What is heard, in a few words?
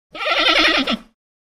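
Monkey Titi Call Whine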